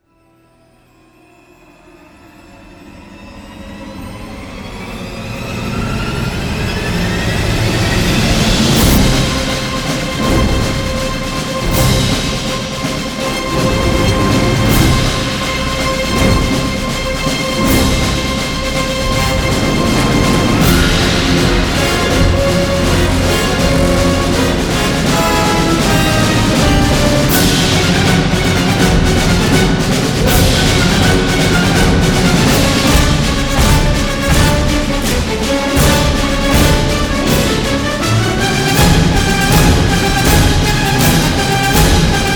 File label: (No Choir), Orchestra